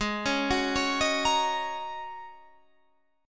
G sharp 9th Suspended 4th, Guitar, Half Step Down

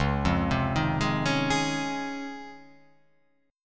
C sharp Major 9th C sharp; x,4,3,5,3,3,3,5

